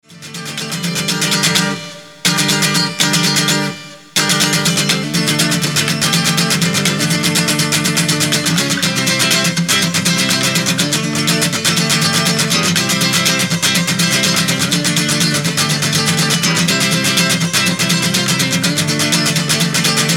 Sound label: solo